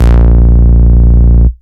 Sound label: Root A#, Ultimate Bass; Vengeance Samples